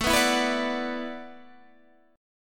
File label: E 7th Suspended 2nd Suspended 4th, A